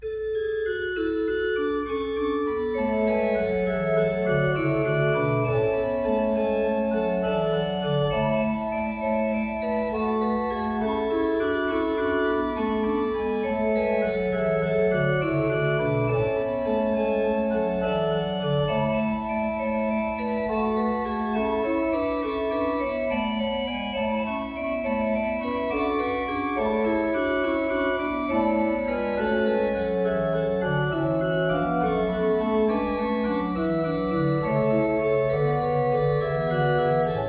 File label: Orgelbuchlein BWV 608; In Dulci Jublio; Bach